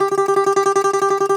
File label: DOMRA, Russian Strings